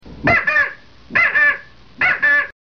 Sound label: look here, crow